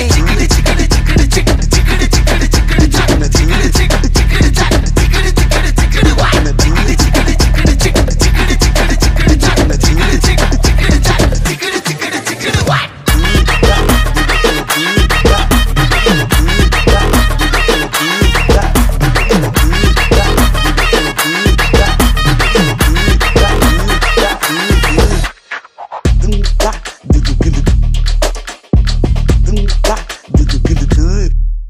Vibe Bgm Ringtone

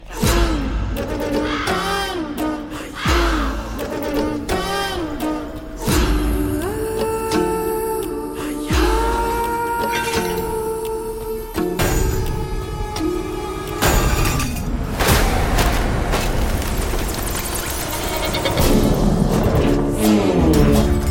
Theme BGM Ringtone

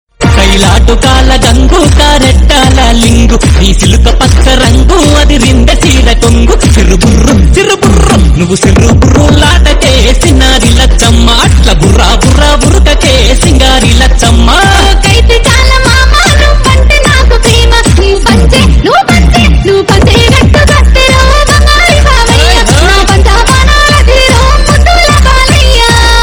Folk Telugu Ringtone

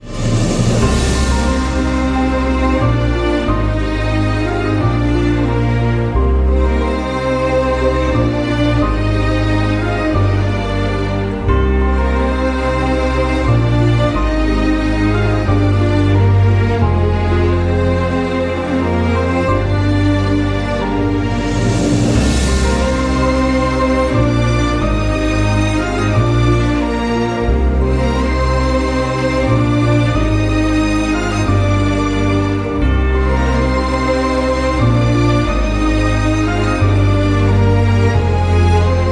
Emotional BGM

